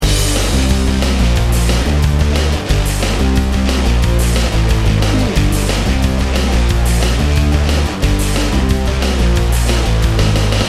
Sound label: loop